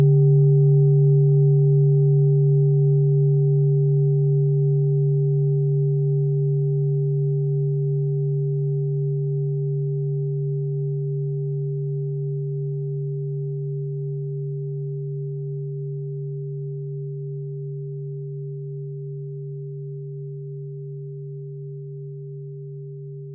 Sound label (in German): klangschale set; Durchmesser = 21,9cm; Höhe = 9,9cm